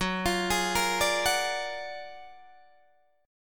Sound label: F sharp Augmented Major 9th F sharp Guitar Open D